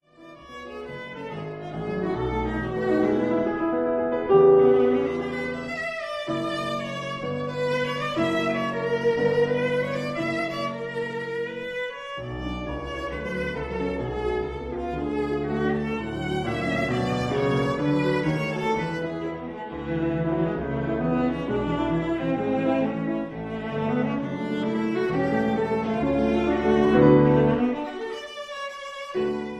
Albumblatter For Viola Piano Allegro; Sitt; Op 39

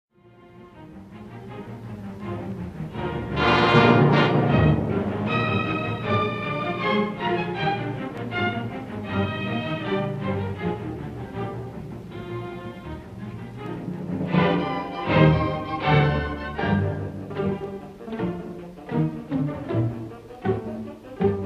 for orchestra Marche au supplice Allegro; Symphonie fantastique

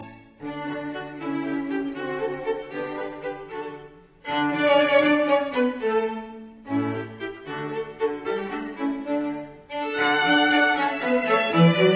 Haydn String Quartet in D major, String Quartet; I Presto; Op 2 No 5; Hob III11

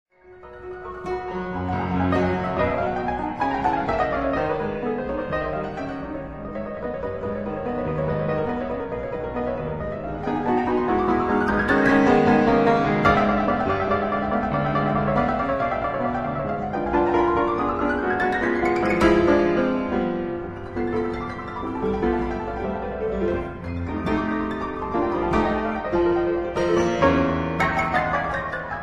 Etudes for piano in G# minor

